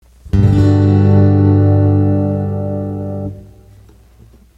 g maj chords 3x0032

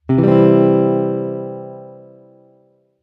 c major c shape

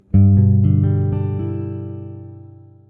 g maj, chord box

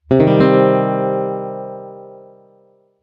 c minor, guitar chord

